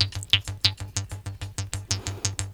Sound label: KHOMUS